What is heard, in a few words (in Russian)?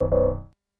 melodiya otkaza vhoda; prilozheniya